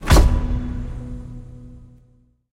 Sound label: scatter Hit; rome